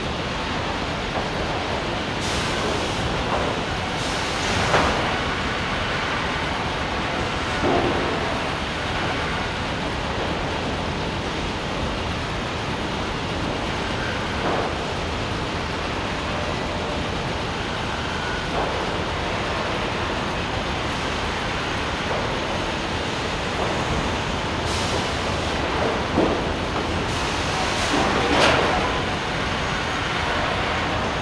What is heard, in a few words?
ambience tram Industrial